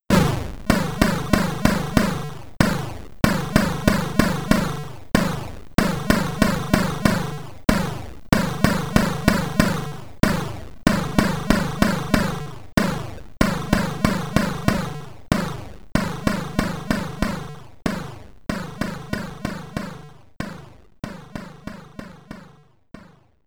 SID Stereo; Flash Gordon; Commodore 64

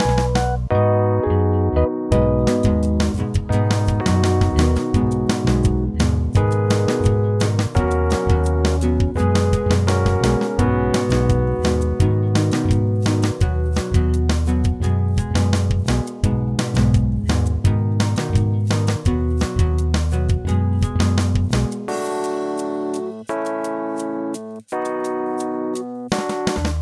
Menu

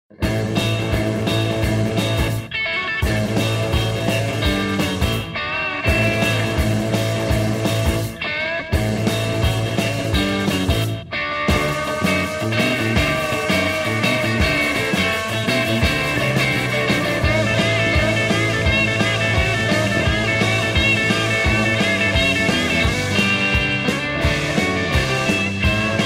Rock N Roll; Vietcong 2

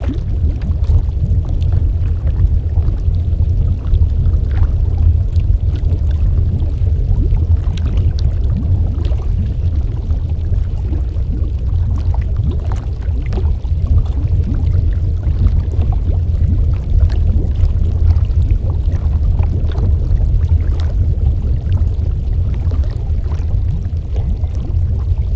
Lava Sound; Angry Neighbor; android

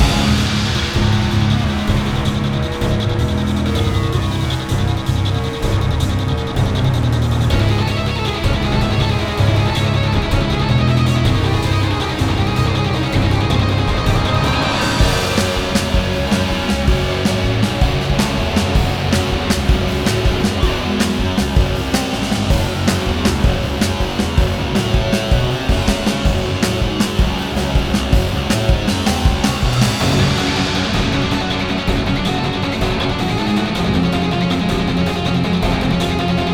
Mini Boss Loop; Cryptheim

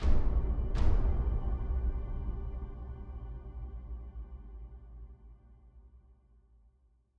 Big Drums; Frozen Flame; Citdel Big Drums

